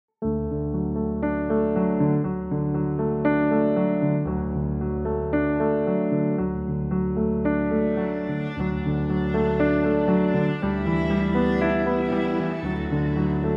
cello

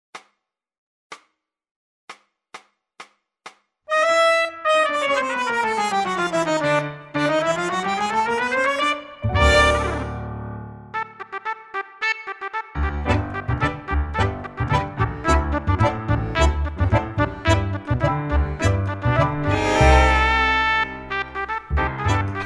TRUMPET

